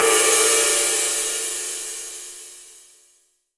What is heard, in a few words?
22 SIZ, 22INCH ISTAN RIDE